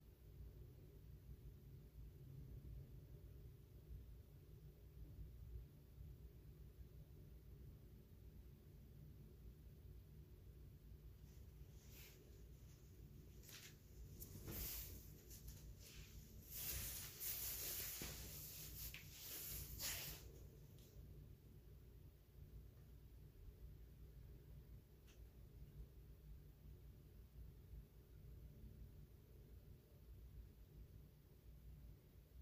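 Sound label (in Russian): одежда раздевание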